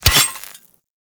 Melee Sword Impact Robotic Flesh; Weapons; Impacts; Swords

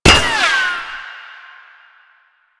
Tank Shield Impact; Enemies; Warframe; Lasrian

